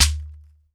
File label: SHEKERE, African Percussion